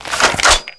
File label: Can Opener Pickup